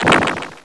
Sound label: se break